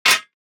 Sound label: DICE on Metal, Drop, Standard, Six Dice; Samples; ArticulatedSounds; DICE Sfx Party (Extended)